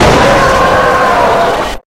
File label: jumpscare sound; fnaf plushies v3